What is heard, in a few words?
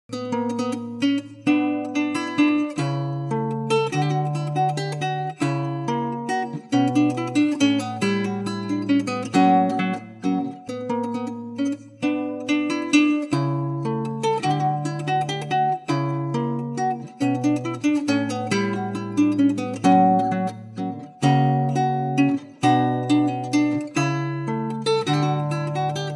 menuett